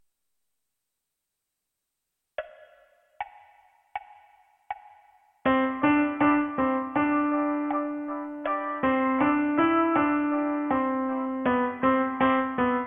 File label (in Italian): piano unite